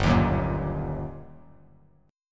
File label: G Minor Major 7th Sharp 5th, down; GmM7#5 strummed; JGuitar